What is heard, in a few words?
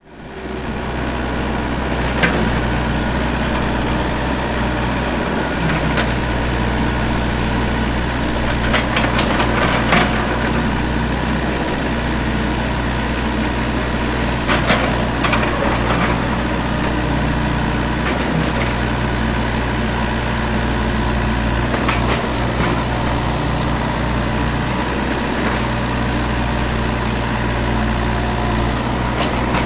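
vehicle construction excavator working; mwsfx; Sonniss GDC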